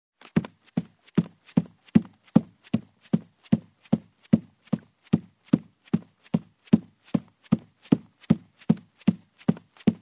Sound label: Running barefoot on a carpeted wooden floor; steps